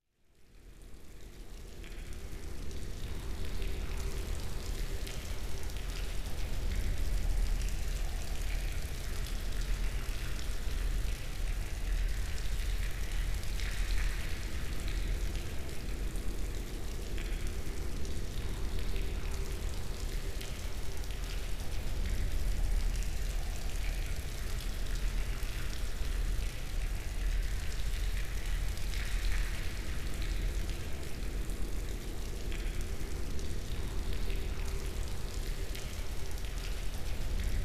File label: Cave Water Fall Ambience - Streaming Water, Deep Cave Tones Underground Sewer Ambie; Hollywood Edge; sound designer's toolkit v2